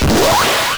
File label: VFX Short Fills & Reverse